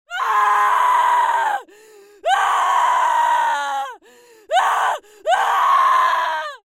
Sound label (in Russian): krik soshedshej s uma zhenshhiny; Звуки сумасшедшего; идиотские звуки, которые издают психи в фильмах страшилках